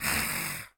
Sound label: Goblin Hiss; SyrinScape